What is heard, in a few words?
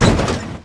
crash; Mafie 1